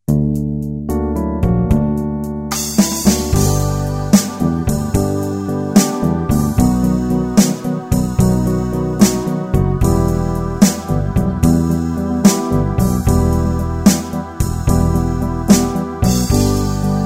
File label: Jazz; MorningRain; MyVirtualBand